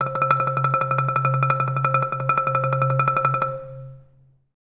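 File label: TREMOLO C3, Orchestra; Best Service; Peter Siedlaczek's Advanced Orchestra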